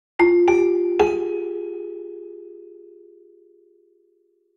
Marimba

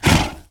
rhino snort

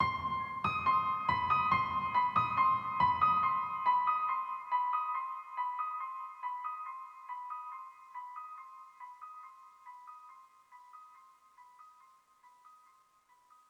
One shot Piano

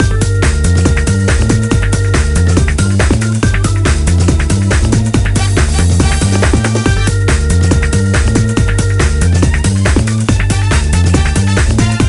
BGM Mini Game

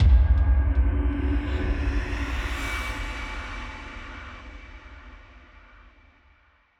Stingers sting misery

